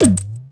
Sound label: tire rubber hit; bouncyobjects; Tony Hawk's Project 8; XBOX